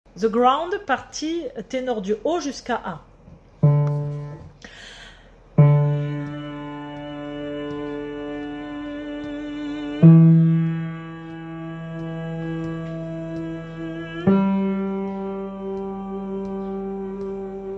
tenor du haut

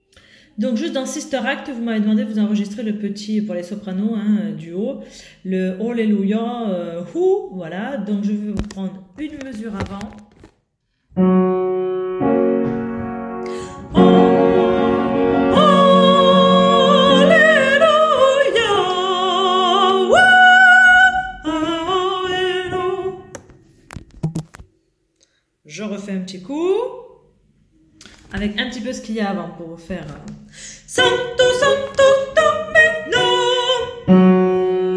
partie solo

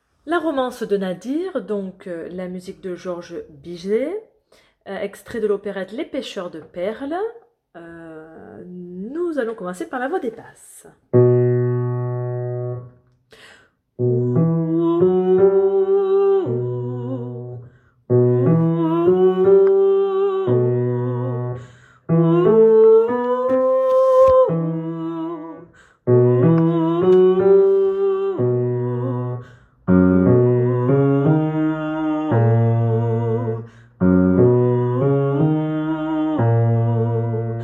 basse; Pêcheurs de perle

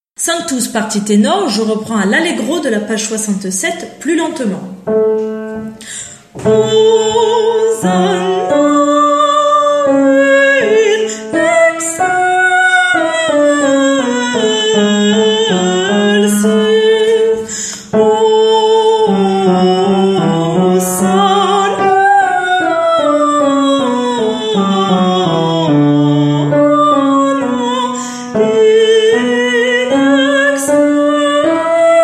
Tenor lent; Requiem; Sanctus; Mozart

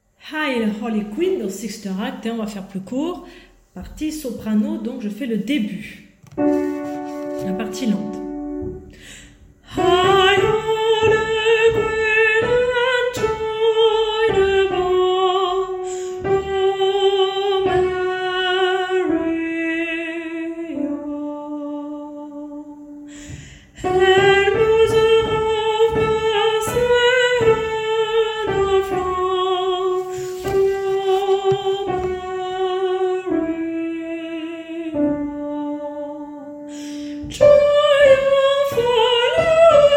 partie lente soprano

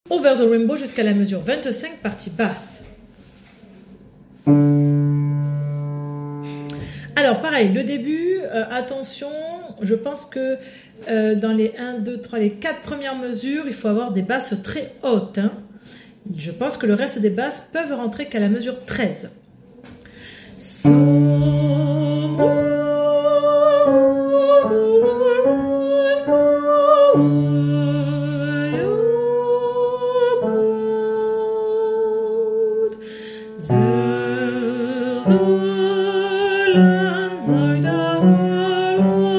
Basse

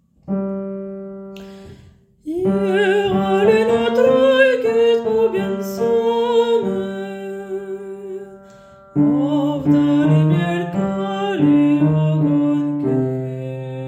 basse bas

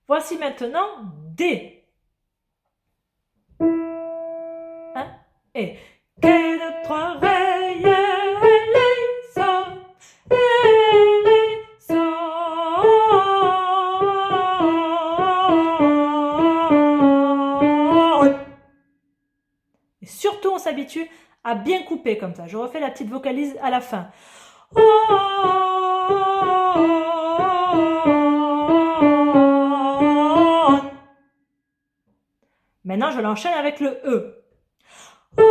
partie alto; Kyrie D

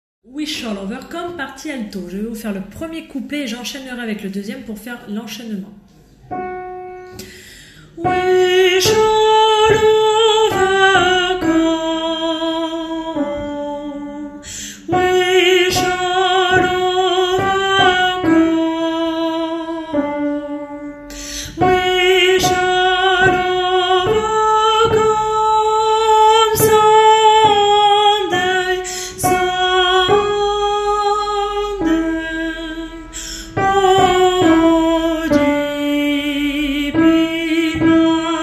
alto enchainement; We shall overcome

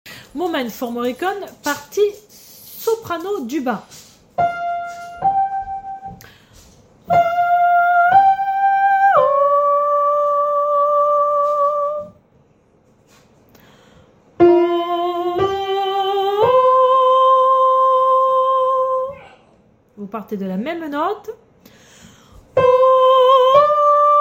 Soprano du bas

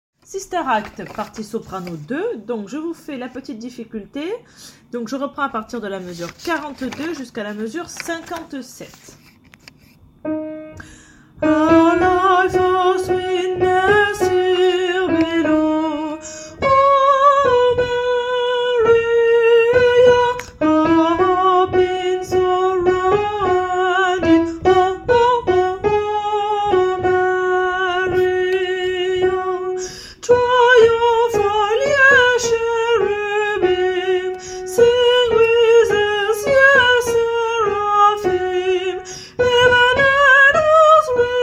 partie soprano 2

